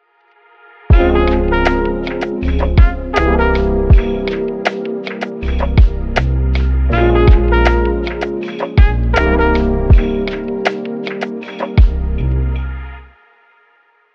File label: chill calm